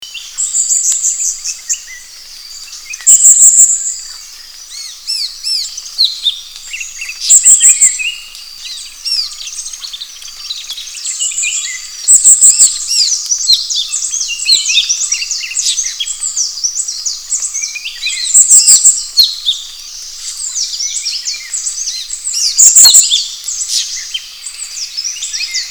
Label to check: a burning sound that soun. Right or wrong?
Wrong